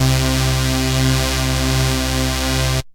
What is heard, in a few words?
lead synth, Euro C2; Best Service Alpha Dance vol.2